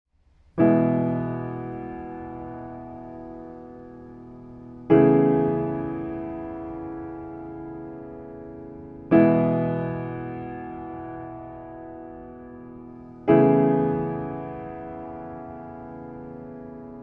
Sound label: Acoustic